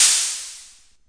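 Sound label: mattel hi-hat, Mattel Synso; LO FI and 8 BIT KITS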